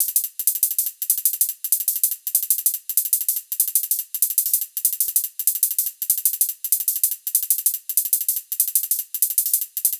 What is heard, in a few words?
ticker hat